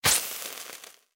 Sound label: Attack Spittle Damage; SFX Fight; Mighty Party